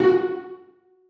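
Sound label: celli pizzicato f#4; Sonatina Symphonic Orchestra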